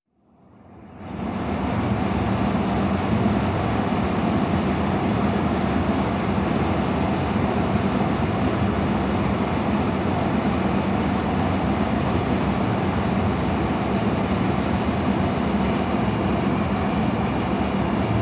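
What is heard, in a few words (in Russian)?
Машинное отделение судна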